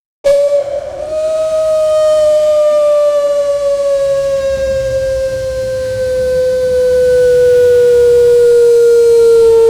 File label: cartoon airplane prop